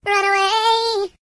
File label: Singer; hedgewars